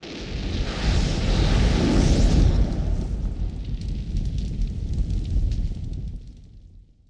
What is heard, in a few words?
WWII Explosion With Flare & Fire; Period Combat